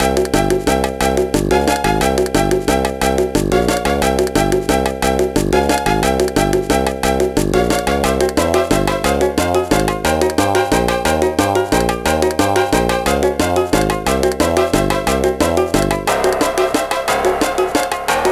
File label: Puzzle Mode, Normal; Mighty Beanz Pocket Puzzles; GBA